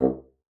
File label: bassoon, C2; Xsample VOL-6